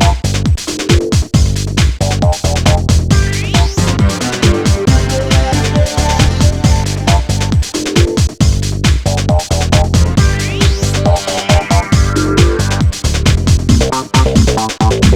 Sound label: song